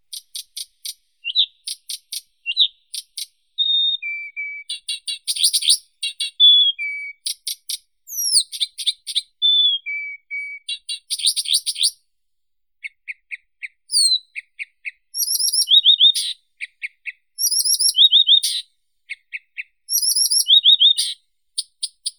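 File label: blyth's reed warbler, acrocephalus dumetorum; kamishovka sadovaya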